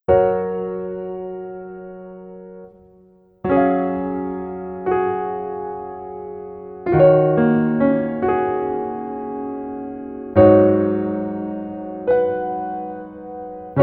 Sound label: Piano